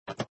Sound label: press button; gamerip; Papa's Freezeria